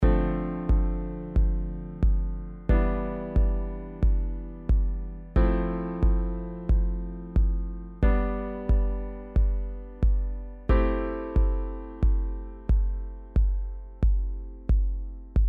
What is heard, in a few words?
Dm7 Em7 FM7 G Am7